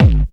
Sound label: KICK hardcore, Techno Trance DRUMS; Techno Trance Essentials